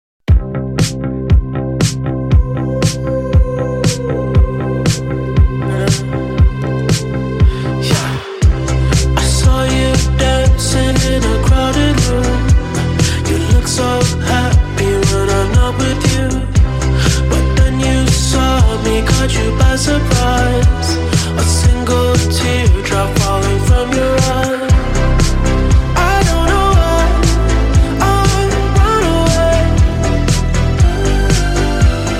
jaz ringtone

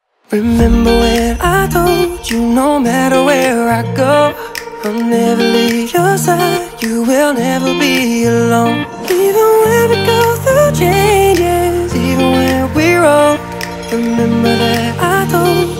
love ringtone

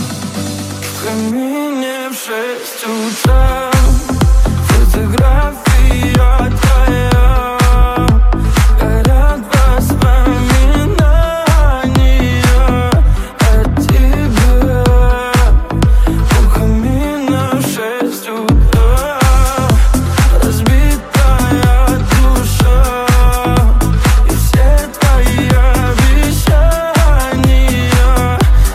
action ringtone

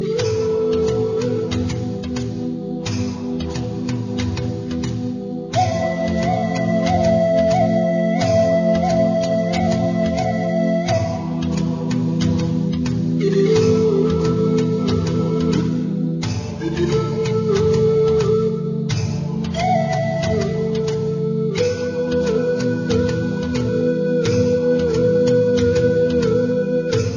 native flute ringtone

